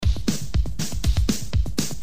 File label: loop 120; Cubase 4